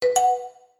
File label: join